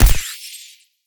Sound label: spark light